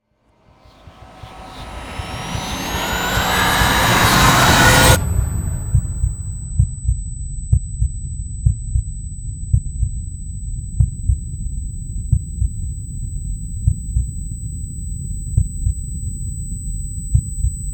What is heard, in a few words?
sound design cimematic impact big then heartbeat low drone; martinimeniscus